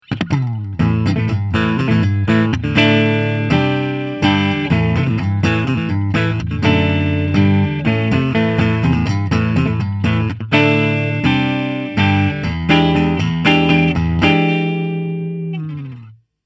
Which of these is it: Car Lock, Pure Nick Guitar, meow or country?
Pure Nick Guitar